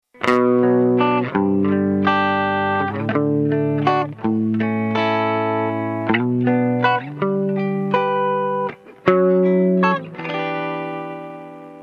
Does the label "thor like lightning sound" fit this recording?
No